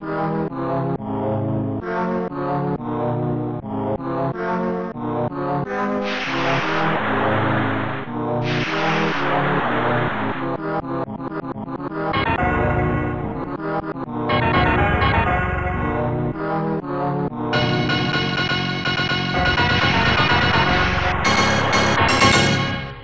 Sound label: creator music; bethzoo